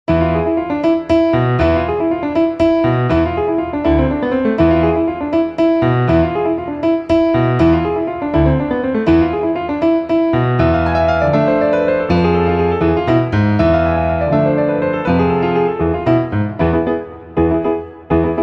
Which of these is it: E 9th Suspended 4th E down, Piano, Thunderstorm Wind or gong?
Piano